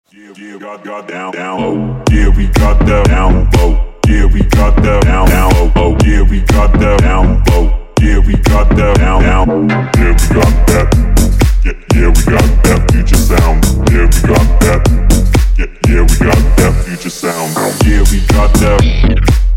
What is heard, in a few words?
future sound